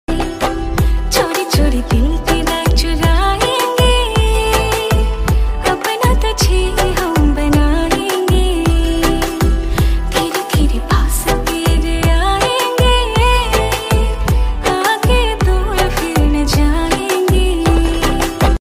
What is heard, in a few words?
Female Status Ringtone